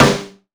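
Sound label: snare, drum kits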